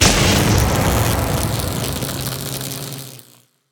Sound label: WEAPONS electrical Discharge